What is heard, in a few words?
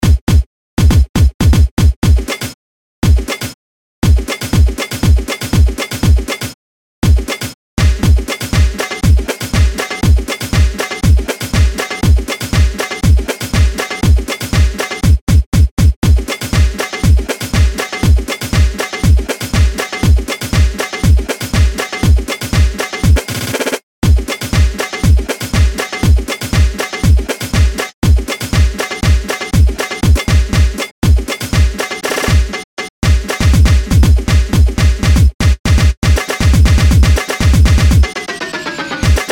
Drumming